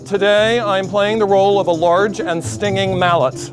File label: mallet